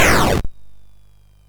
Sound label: Frantic, MOS6581R4